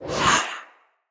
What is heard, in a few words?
Staff Caster Cast Short; Weapons; Player; Staves; Wazzard Staff Caster